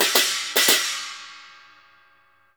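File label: CHINA LG15 R, China cymbal; Spectrasonics; Heart of Asia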